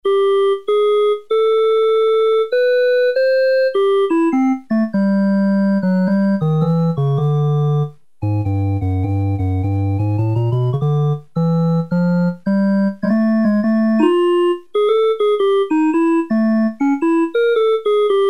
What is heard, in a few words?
SOFT ORGAN, Casio CZ-1000